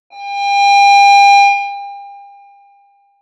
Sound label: timer